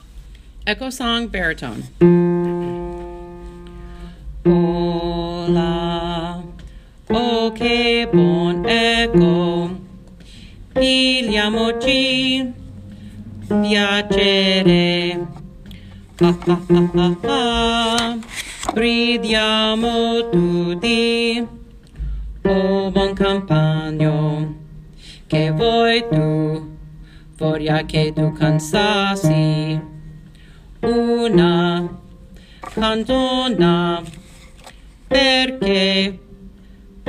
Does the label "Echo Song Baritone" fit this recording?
Yes